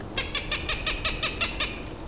Cooper's Hawk; ibm glen

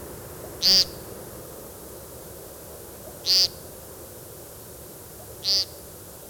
American Woodcock; ibm glen

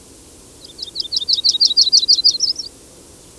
Yellow-rumped Warbler; ibm glen

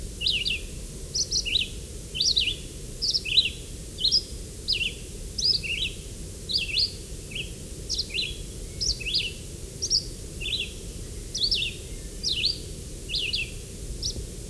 Red-eyed Vireo; ibm glen

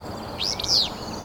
Willow Flycatcher; ibm glen

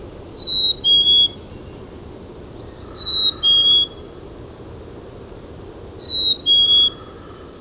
Black-capped Chickadee; ibm glen

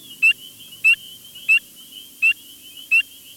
Spring Peeper; ibm glen

